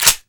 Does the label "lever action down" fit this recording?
Yes